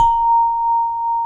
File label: VIBRAPHONE; ILIO